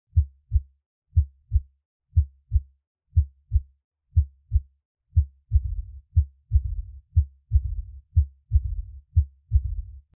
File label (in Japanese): 拡張中期雑音